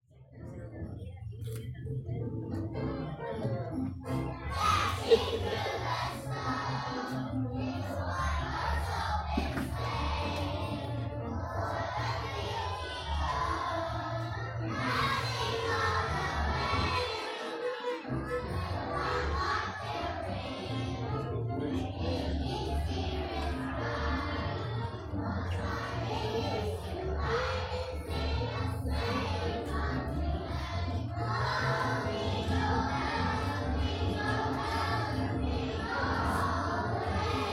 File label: the kindergarten winter concert